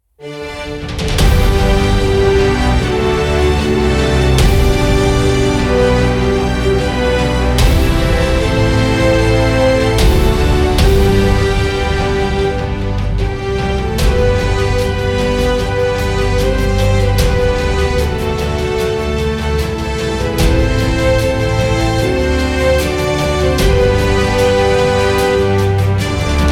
french national anthem la marseillaise epic version